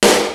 METAL DRUMS; Kid Nepro; Elite

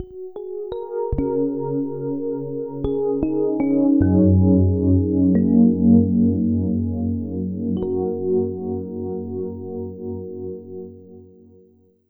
FM Chords, Keys; Sonic Foundry (Sony Creative Software); Slow Silhouette

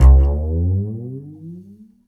Upright Acoustic Bass, 1ST POSITION; Larry Seyer

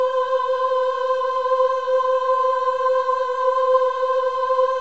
VIB M C5 -L, Symphony of Voices; Spectrasonics

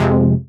Fm Dub Chord

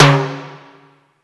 F# Timbale L, Yamaha PSR E305

